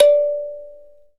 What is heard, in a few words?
Thumb Piano D4; EMU Planet-Earth